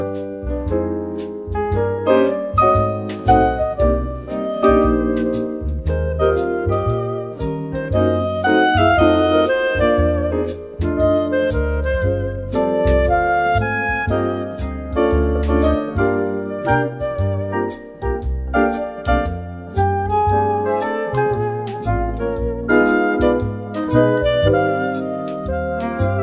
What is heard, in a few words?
christmas jazz